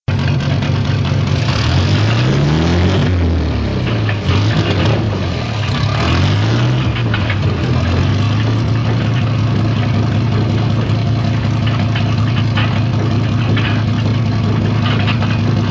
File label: boss Buggy; Angry Birds Space